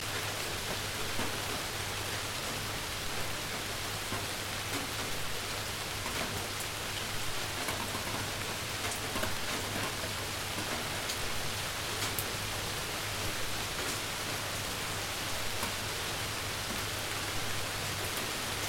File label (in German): Hochsommergewitter